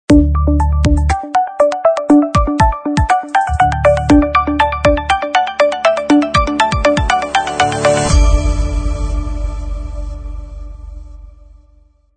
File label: Inspiring Electronic Logo